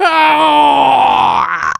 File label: Civilian pain critical death